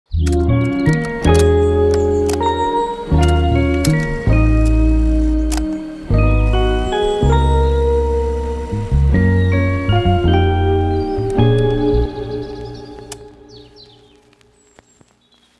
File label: Brit-funk & Street Soul